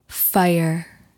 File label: Fire